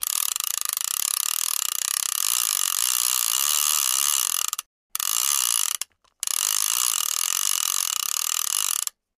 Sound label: Fishing reel being reeled in at medium and fast speeds, close up.; Reel, Fishing Click, Fishing Reel Ratchet